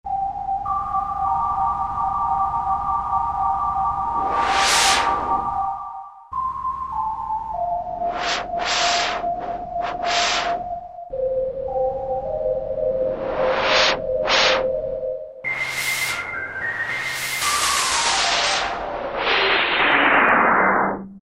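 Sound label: Sfx Noise Band, E-mu Morpheus; Factory Presets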